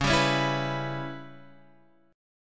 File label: C sharp Minor 7th Flat 5th C sharp Guitar Half Step Down; C#m7b5